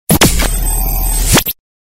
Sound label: Galery Noise; Sound Ideas - Galery Noise 002